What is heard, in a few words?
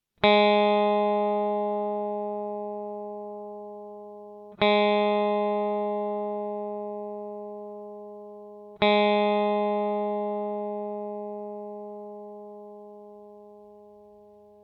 open e